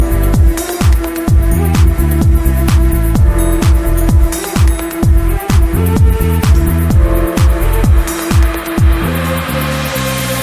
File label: Suitcase Pianos